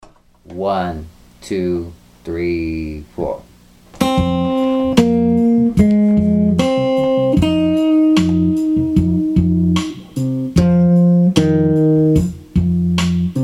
blues

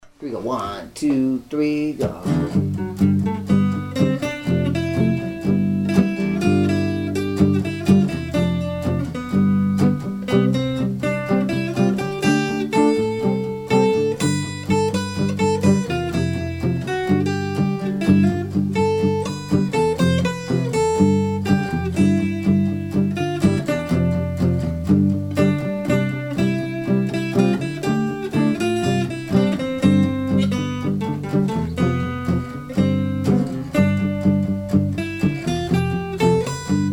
modal backing tracks

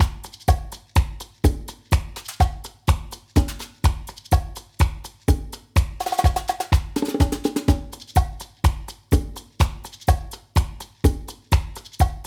bachata drum groove at 125

